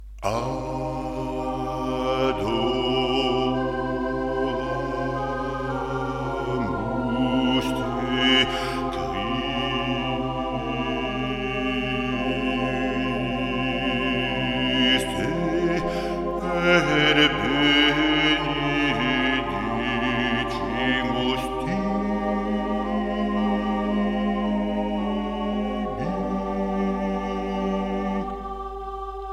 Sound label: Adoramus te, choeurs; Gasparini